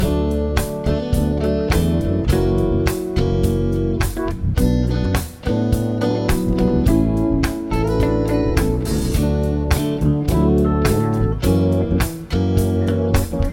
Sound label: progs with applied chords